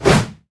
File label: swipe, weapons